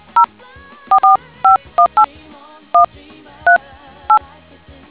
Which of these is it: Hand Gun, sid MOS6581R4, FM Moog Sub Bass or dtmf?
dtmf